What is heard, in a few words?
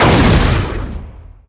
explosion normal; weapons; cow mangler; tf2